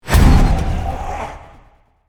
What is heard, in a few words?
Sorcery spell shadow whispers